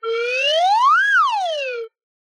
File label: slide whistle long crescendo